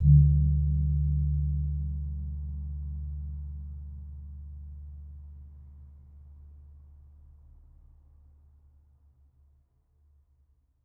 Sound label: Suwukan, Gamelan